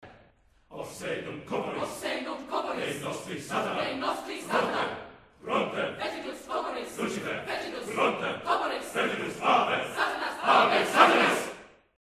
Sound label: scream; Satanic Chant - a capella